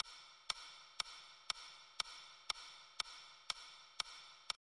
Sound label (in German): funkstation